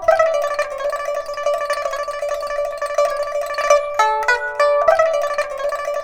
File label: PIPA, 90; INIS - Opium